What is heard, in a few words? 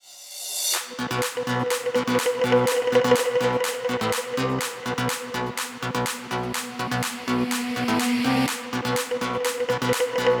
house electronic techno; By Burak Sound Bank